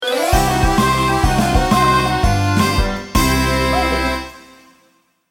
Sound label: Defeat Jingle; Magical Drop VI; Windows